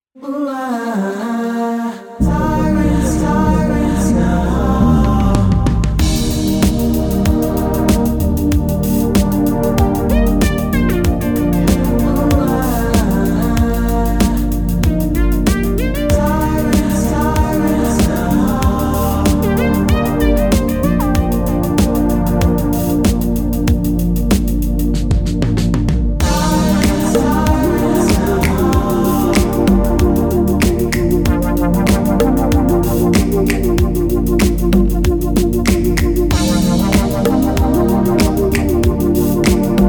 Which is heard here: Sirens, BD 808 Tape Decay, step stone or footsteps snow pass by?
Sirens